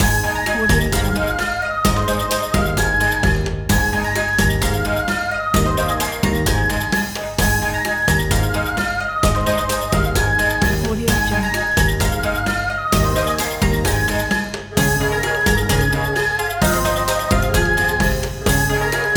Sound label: new year in china